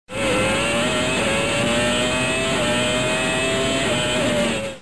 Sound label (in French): Formule 1